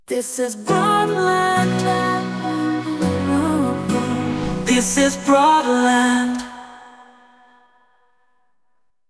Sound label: sung